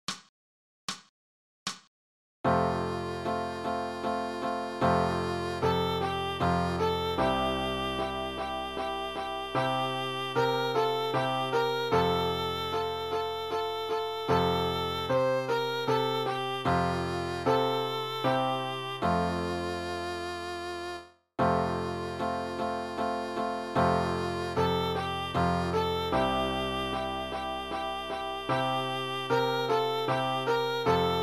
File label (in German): Trompeten Menuett, viola